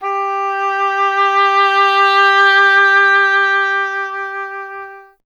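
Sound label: oboe, wind; Miroslav Vitous - Solo Instruments VOL-2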